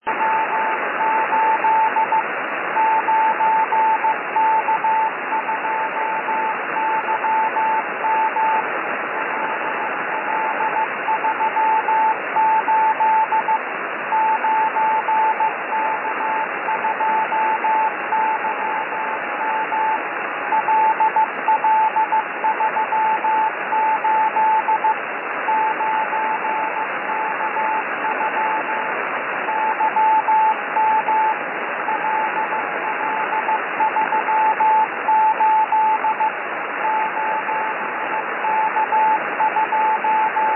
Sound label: 6m beacon